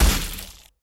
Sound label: Massive Hammer Hit